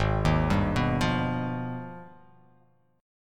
G sharp Minor Major 13th; 4,6,5,4,5,x,x,x

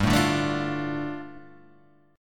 G 7th Suspended 2nd; G7sus2 Chord {3 0 0 2 3 1} strummed

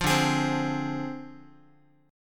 D Minor 7th Flat 5th Guitar; Dm7b5; strummed

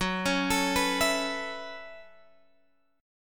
F sharp Minor 11th, Guitar, Open G

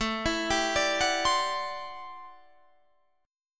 A Minor 13th, Guitar, Standard; Am13 arpeggiated; JGuitar

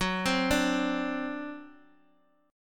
C Suspended 2nd Flat 5th Gb

